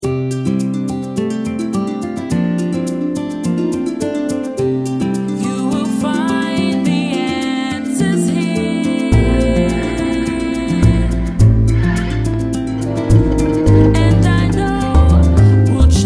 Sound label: ETHNIC NAMIBIE BALLAD